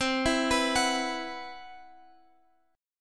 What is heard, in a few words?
C Major Sharp 11th, C, down, Guitar, Open F; arpeggiated; CM#11